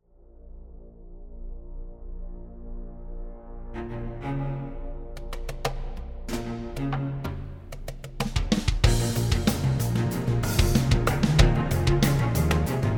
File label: Cue Steven Slate Drums